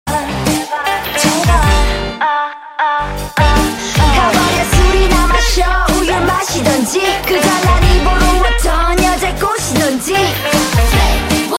rap ringtone; for samsung

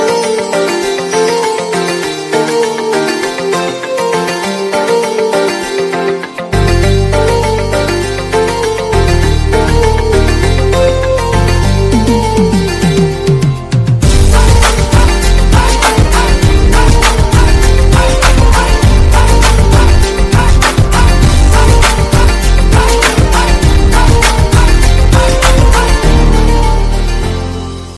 hindi love ringtone, bollywood ringtones

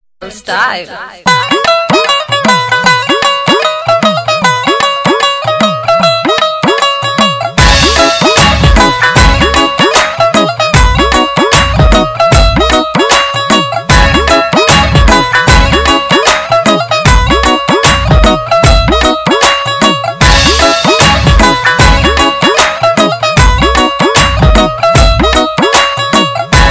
instrumental ringtone

